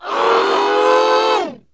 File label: moan